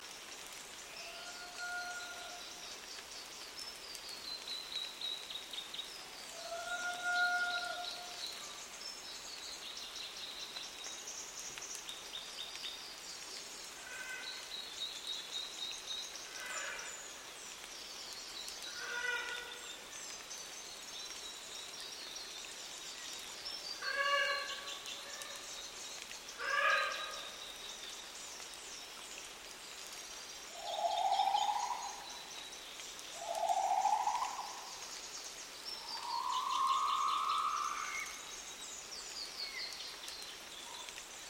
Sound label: Moa (Reconstructed)